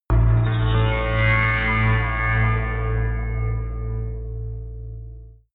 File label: whistle synth, drones